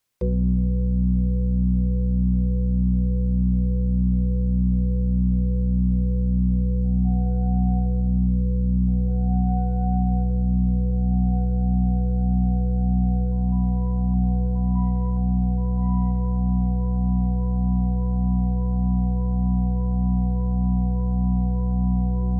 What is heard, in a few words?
Organ Flute; hyper terrestrial; But I Cant Hear What Youre Saying; Footage